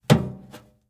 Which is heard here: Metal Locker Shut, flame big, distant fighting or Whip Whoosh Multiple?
Metal Locker Shut